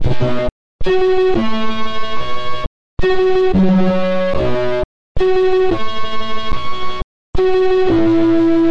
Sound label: 110 synthetic flute melody; SYNTH AND ELECTRONIC LOOPS